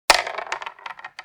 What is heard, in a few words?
Chess capture piece knock; leisure